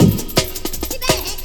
Lym Cut, Jungle, Drum Loops 165.5; Zero-G Jungle Warfare 1, 2 & 3

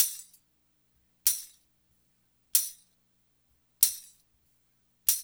TAMB; East West; Funky Ass Loops

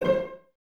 CES C 5 Pizz, Cellos, String Ensembles; Miroslav Vitous

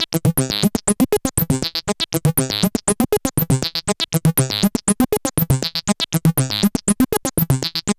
Synth Sequence

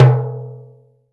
Timbales Xswitch, center; E-MU Producer Series Vol. 2 – More Studio Essentials; Composer

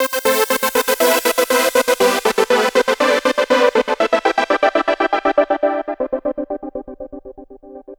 Trance Synth

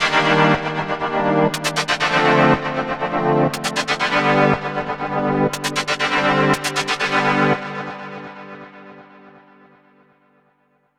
Dreamy, Arpeggios & Sequences; Dr. Fink's Funk Factory

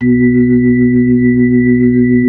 B-3 Chorus

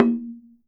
TASHA, ETH PERC; WorldSounds

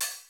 cymbal, Roland MC-909

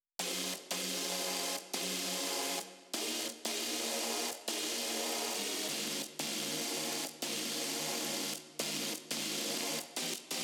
drop chord

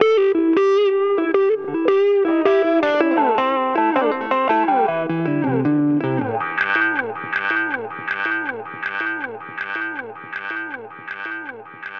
Delay Guitar

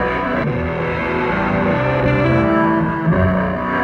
Burning, Electric Guitar; Sonic Foundry (Sony Creative Software); Friendly Fire; Wrath

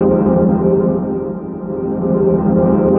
Synth Phat; Sonic Foundry (Sony Creative Software); Knutrix Drum & Bass

